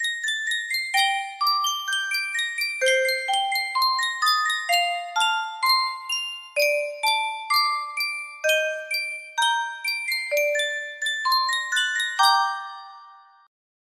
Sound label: Yunsheng Custom Tune Music Box, music box melody